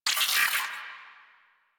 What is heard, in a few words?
Space Drone Death; Warframe; Corpus Space Drone